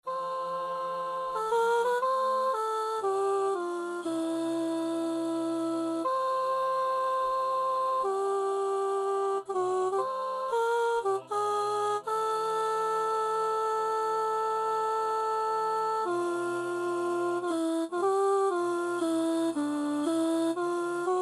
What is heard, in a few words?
soprano